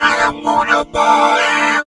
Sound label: TALKBOX